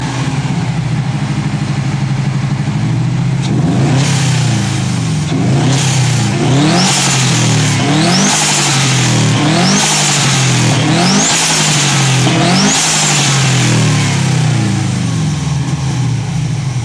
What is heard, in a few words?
subaru wrx sti sound; ringtones